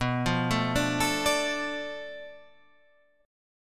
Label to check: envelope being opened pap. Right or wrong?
Wrong